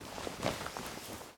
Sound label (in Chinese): 手交互成功